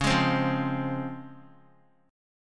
C sharp Major 7th; strummed; chord

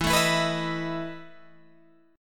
E Major 7th Suspended 4th, Guitar, Open G

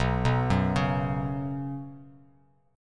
B Suspended 2nd B Bass Standard, chord; arpeggiated; JGuitar

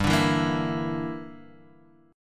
G Minor 6th, Guitar Standard

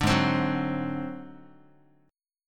Ab 7th Flat 5th Guitar, chord; strummed

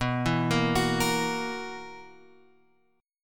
B Major 7th Suspended 4th, B, down, Guitar Open G

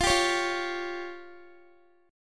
F Suspended 2nd Sharp 5th F down Guitar; Fsus2#5; strummed

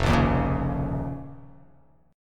F 7th Suspended 4th Sharp 5th F down

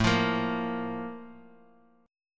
Bb 5th A; Bb5/A strummed

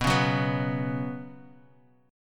B Suspended 2nd, Bsus2 chord; strummed; JGuitar

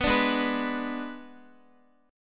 Ab Diminished Ab Guitar; Abdim strummed; JGuitar

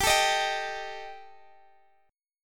G Suspended 2nd Sharp 5th, Guitar Drop D; chordshape; down

